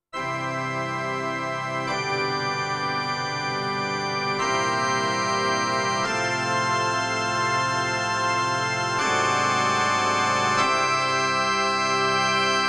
Church Organ

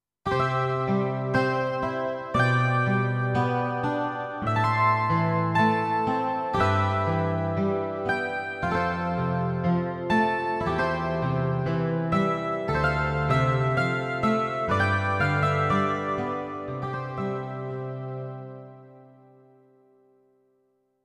Santur

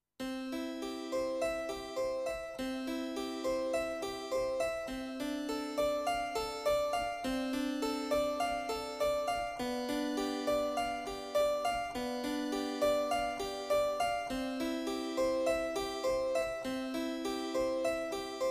Harpsichord

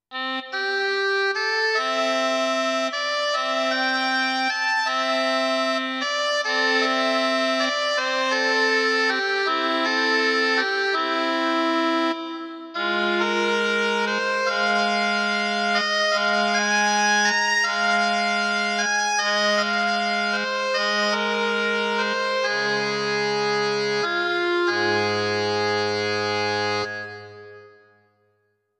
Hichiriki

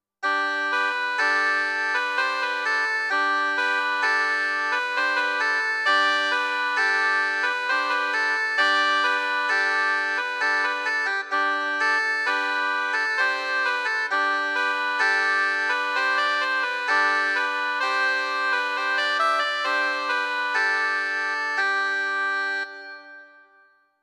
Shanai

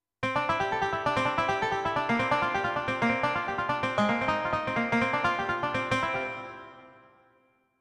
Rabab

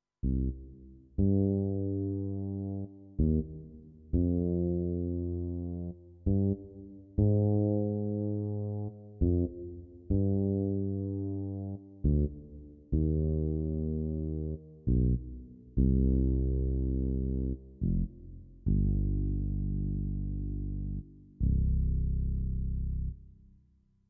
Fretless Bass

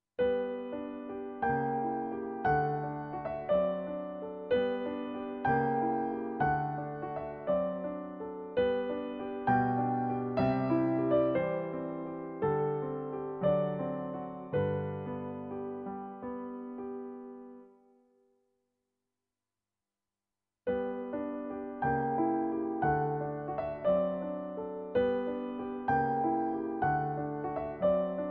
Grand Piano Brilliance

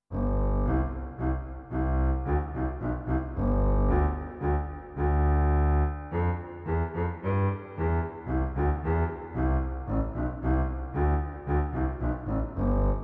Contrabass

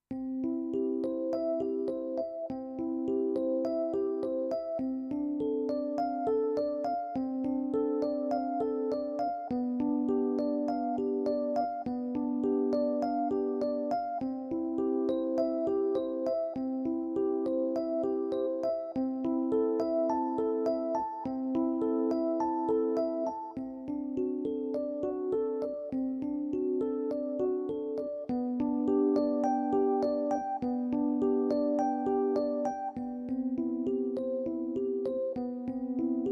EPiano

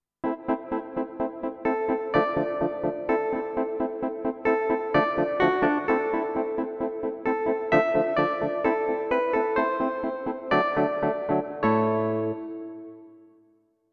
60s El Piano

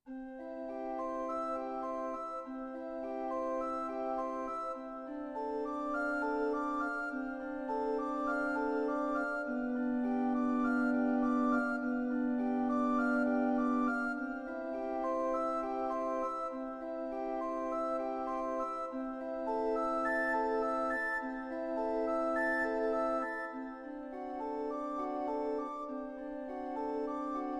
Pipe Organ Flute

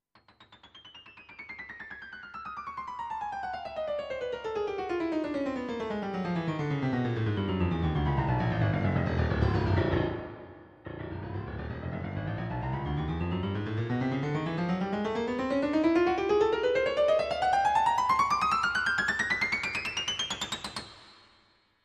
Rock Piano

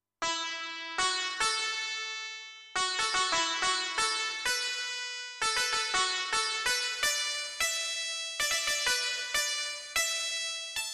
Sitar

